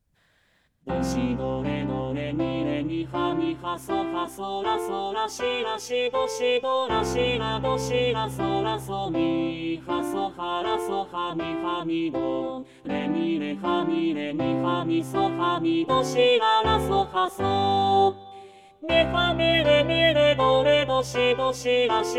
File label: Triplets